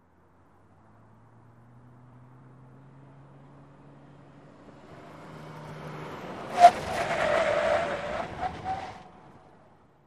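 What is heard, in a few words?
SUV Truck 180 Rev Skid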